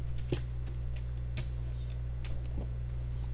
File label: scratch